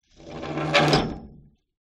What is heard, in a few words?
industrial lever pull old rusty grind